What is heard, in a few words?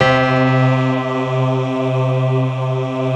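C 2, PIANO CHOIR; Best Service; Advanced Media Trax Modern Composer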